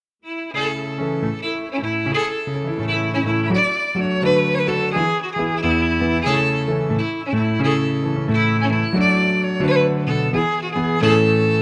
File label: FIDDLE PIANO; Jink & Diddle Book o' Tunes 2022